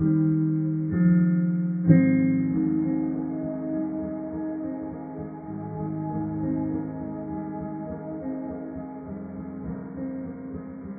Piano

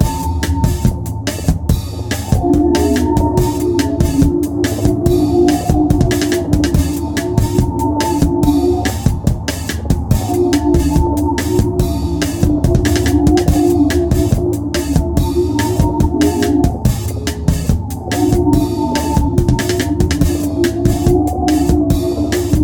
bossa

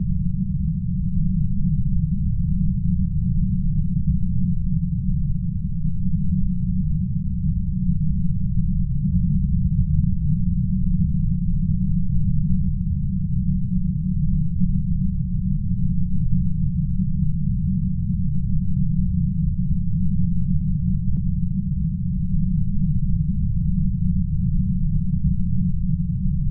drone

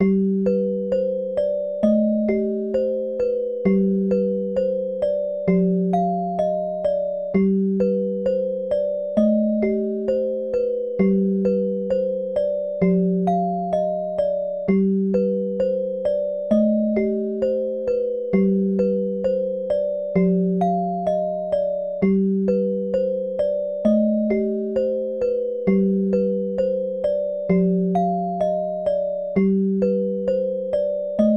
bgm orgel slower

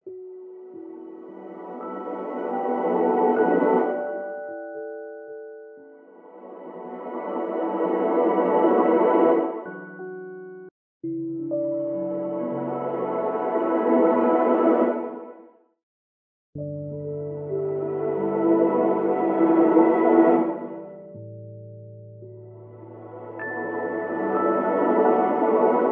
cement ripple

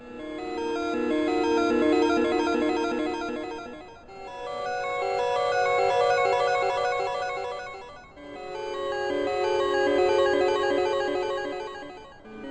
maple chimes

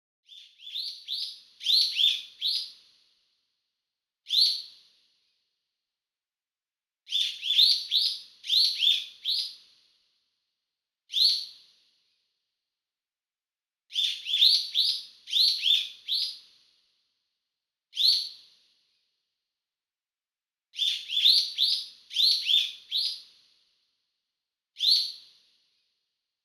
bird

